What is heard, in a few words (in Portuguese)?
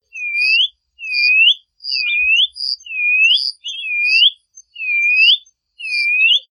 Canto da Patativa Chorona